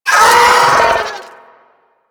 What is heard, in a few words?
Sentient Vocal Pain, Enemies; Warframe; DE; Sentients; Ortholyst